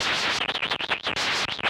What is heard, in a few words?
SPACE FX 140; SpaceSounds